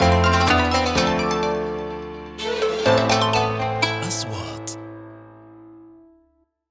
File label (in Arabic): نغمة شعار; sound track